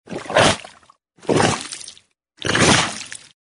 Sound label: comedy swamp steps